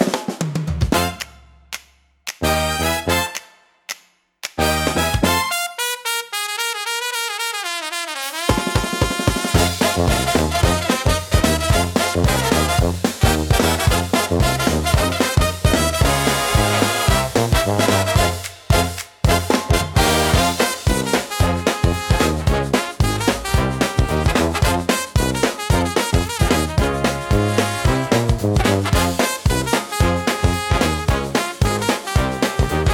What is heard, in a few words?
Street Parade Riot, upbeat carnival; for festivals and parades